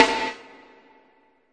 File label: supergate snare; single hits